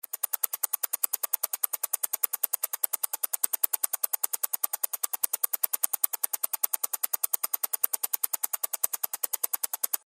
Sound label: 36000 El PRIMERO